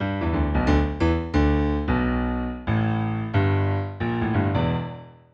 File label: Piano Bass line